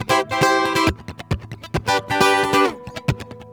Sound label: MERENGUE, World Grooves